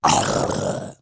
zombie rage; zombies

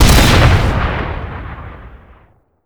shotgun shoot; blastshotgun

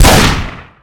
fire

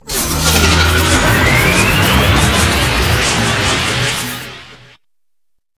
boss incoming

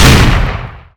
double tap fire

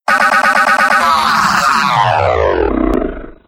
death glitchy

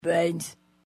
zombie groan; mvm forever

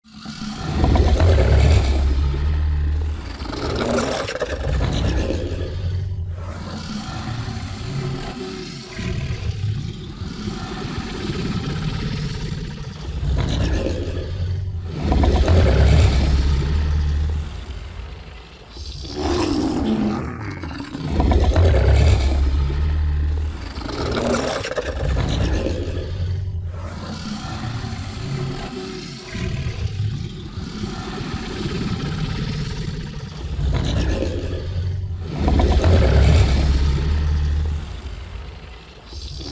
idle loop; breadspace; breadboss

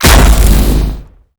hammer slam

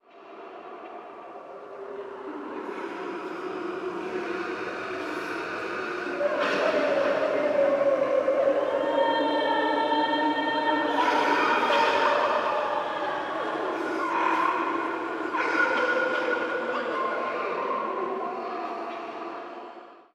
hell wails random

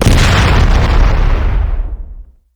meteor explode, weapons

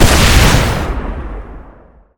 hornet nest fire

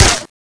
supernailgun shoot

